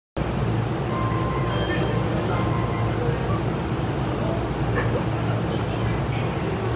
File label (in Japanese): 埼京線北与野南, train sounds